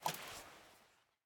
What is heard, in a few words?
Take item; miside demo